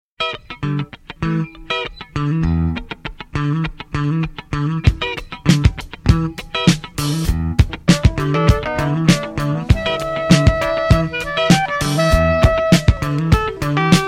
funky Korean soul

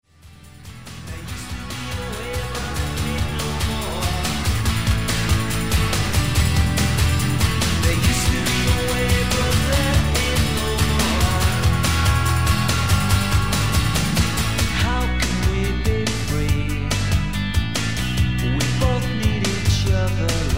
electronic

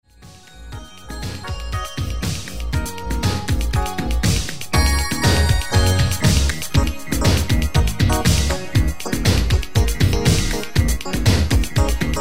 instrumental

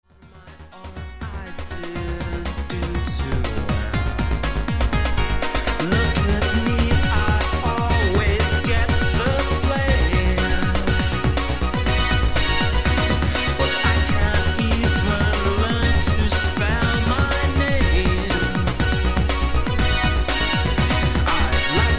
electronic

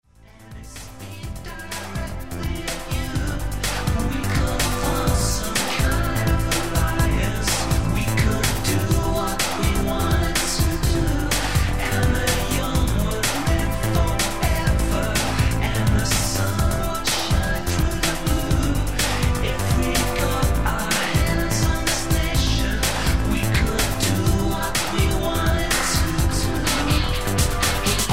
electronic

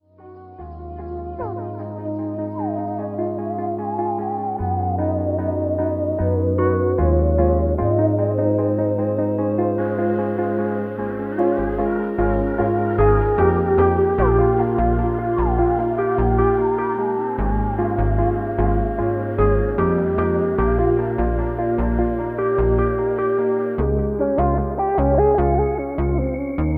instrumentals

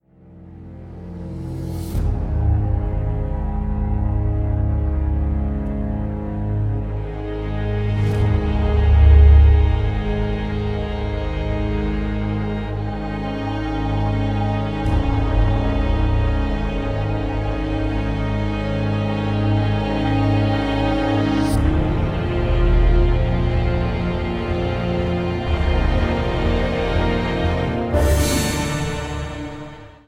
game over; scott pilgrim SCORE

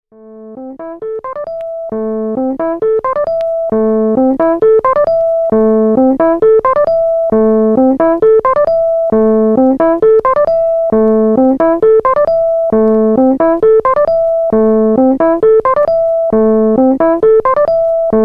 rhodes am c maj 13333

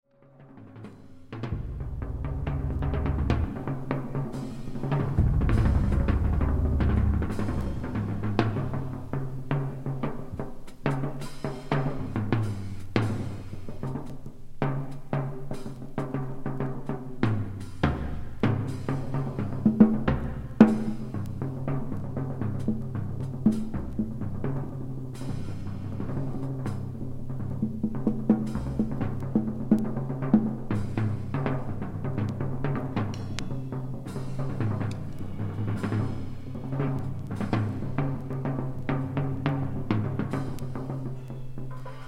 drums

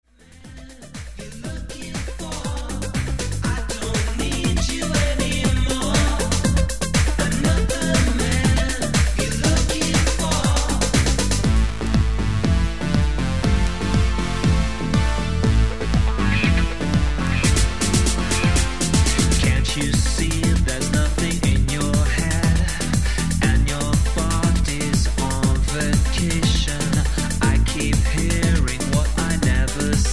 electronic

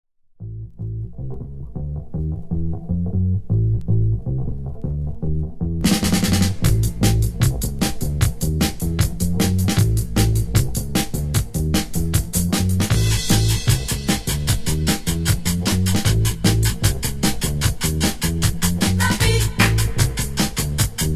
beat

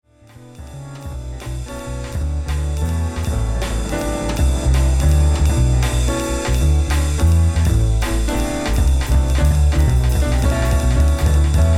space jazz and percussion ensemble waltz

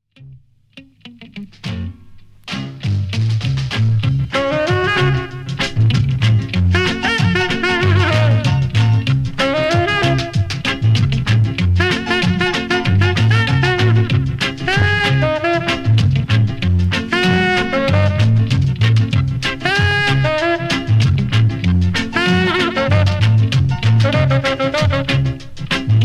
instrumental reggae

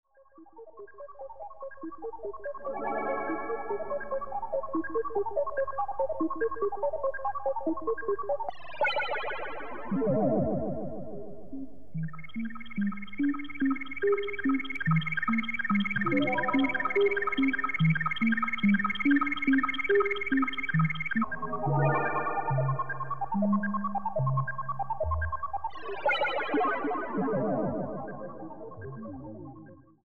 radiophonic music; bbc radiophonic workshop; tomorrows world

